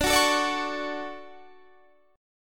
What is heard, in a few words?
D Major 7th, chord; DM7 strummed